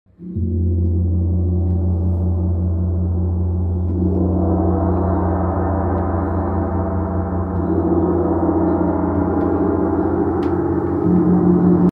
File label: gong